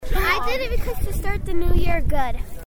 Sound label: plunger